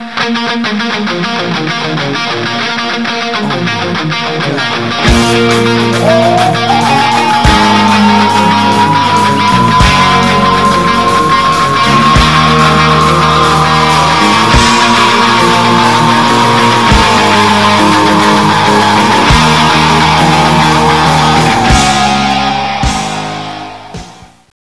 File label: jungle; quake2